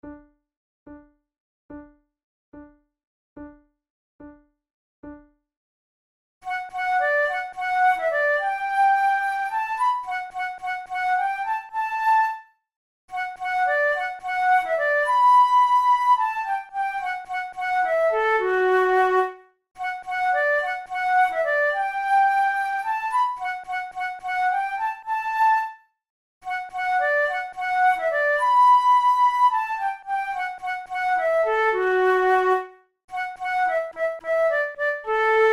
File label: duet, flute; Moran; the carrier pigeon